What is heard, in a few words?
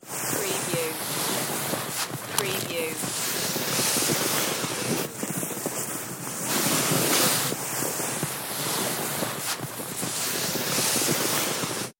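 Drag Sled Snow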